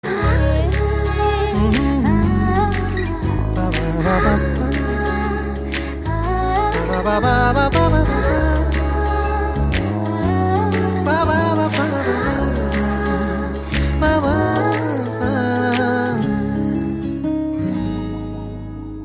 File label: Female Humming